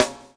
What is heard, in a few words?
SLICK, Backbeat